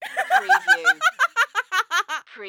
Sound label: Laugh Female; Sound Effects Bible